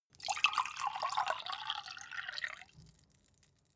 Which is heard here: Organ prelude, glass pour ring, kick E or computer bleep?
glass pour ring